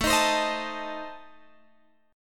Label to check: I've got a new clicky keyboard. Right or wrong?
Wrong